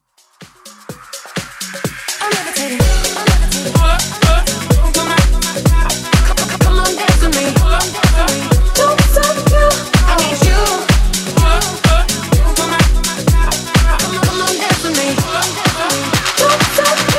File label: House Mashup